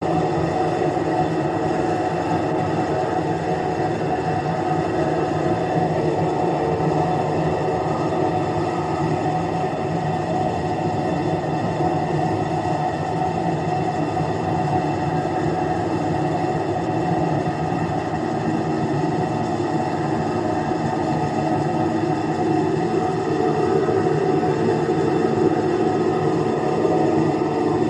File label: Ambience Tonal Gas; drones & tones